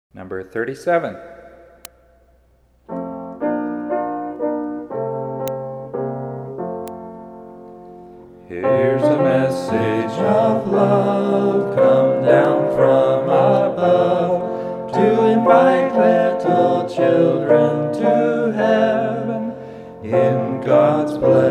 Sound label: Hymn